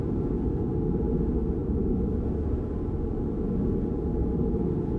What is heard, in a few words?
Warp; blue archive